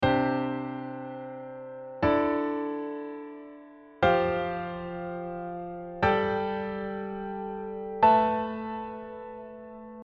diatonic sus2 4

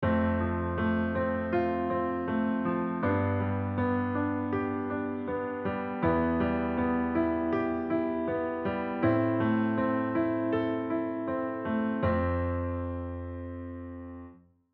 comping

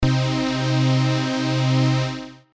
Chord Lead vcf

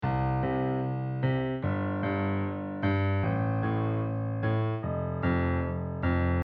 shuffle

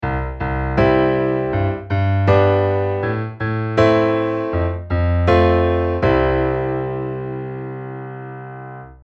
8 beat

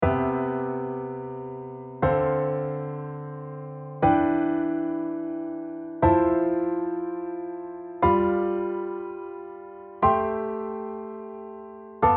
diatonic LH R7

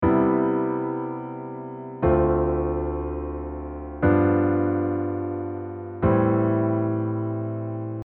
chord cadence

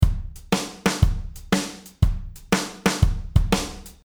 shuffle

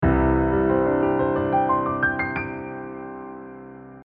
Csus2

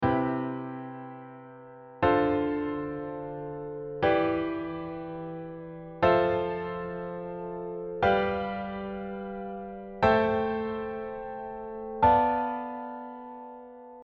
Diatonic 7th Root

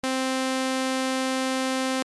SAW only

